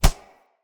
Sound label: Unarmed Punch Impact Flesh; Weapon Impacts; Player; Combat